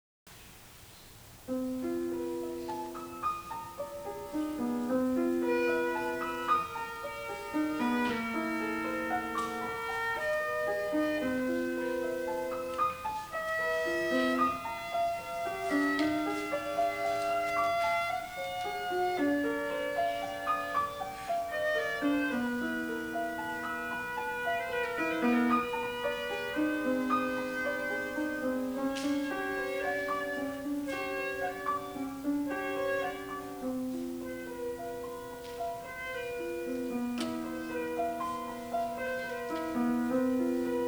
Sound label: Wind Up Toy